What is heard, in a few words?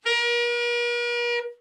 tenor soft, sax; Giga Samples Collection